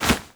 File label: Tenno Alt Holster Weapon, Foley; Warframe